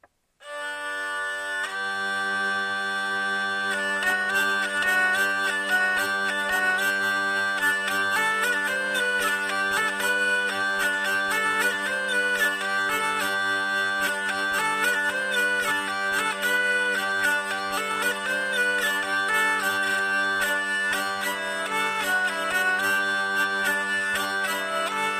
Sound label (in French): bourrée 3 t aurelha del lebre